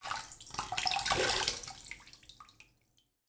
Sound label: Human Male Washes Face In Sink Water Drips